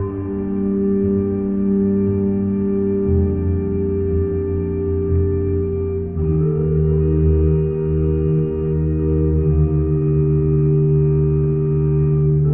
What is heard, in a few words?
keys organ; loops